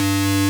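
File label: DISTORTED SYNTH G-2; AKAI S-Series CD-ROM Sound Library VOL-7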